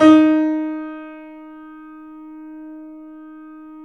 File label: The Piano D#; InVision Interactive - The Piano